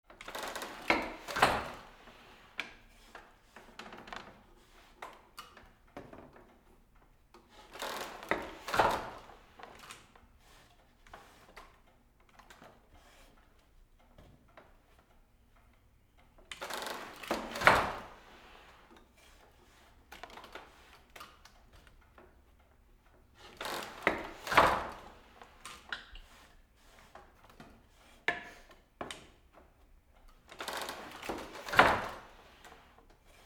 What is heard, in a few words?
Loom Antique Loom In Use Weaving; Electa Quinney